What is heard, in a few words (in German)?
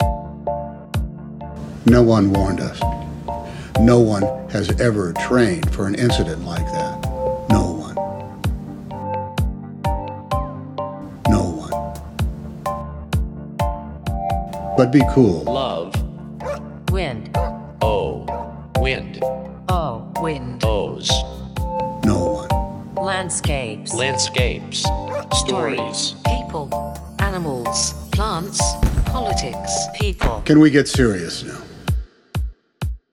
Klingelton